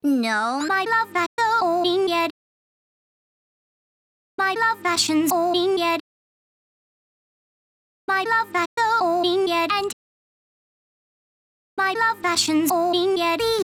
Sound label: vocals, 130BPM; Pony Fan Music Remix Stems